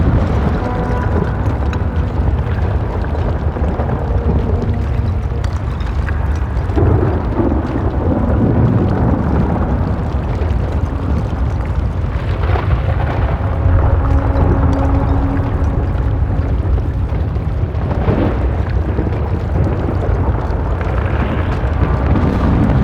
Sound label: Large Arms Move Loop; Ambience; Gameplay; Warframe; Duviri